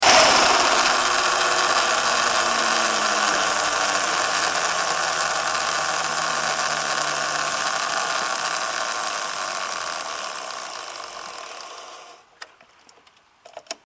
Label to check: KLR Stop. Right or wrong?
Right